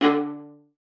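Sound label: D5, viola spiccato; Xsample VOL-5; 90 s Sample CDs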